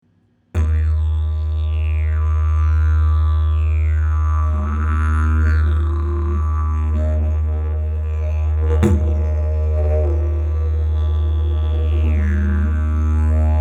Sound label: didgeridoo